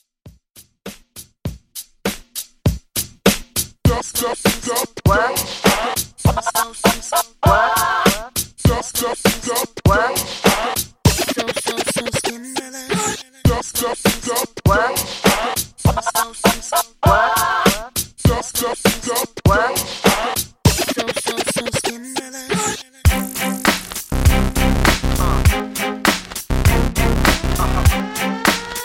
Funk Scratch Dj Intro Outro 100Bpm

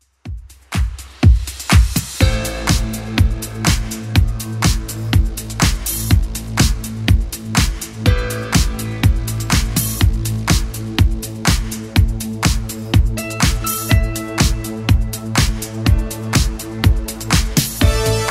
80s Club Edit 123bpm

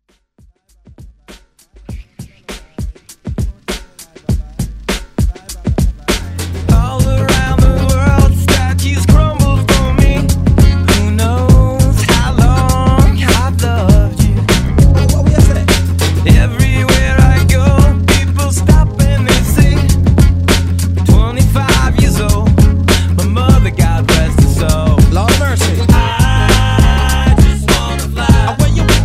Throwback Redrum

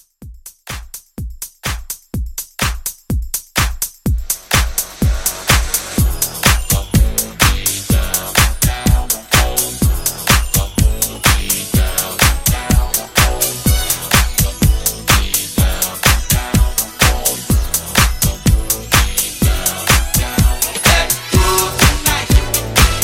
House Re Drum 125; 80 House

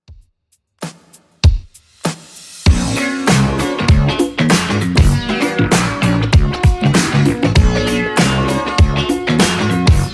70s Redrum 98bpm

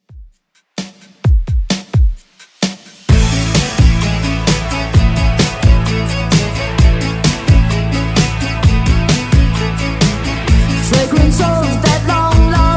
80s 130 bpm; Redrum

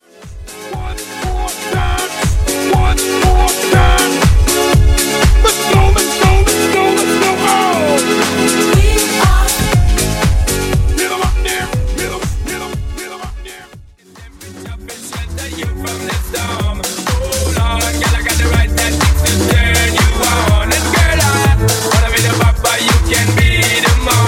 Old School Classic House Mix

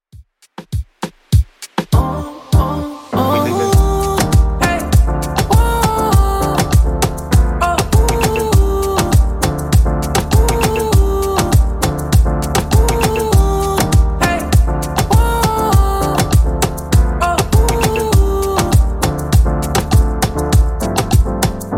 Top40 Redrum 100bpm

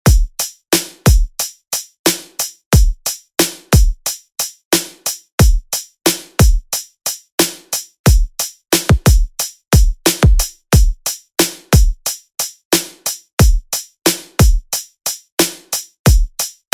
Pop Redrum; Deejay Hit

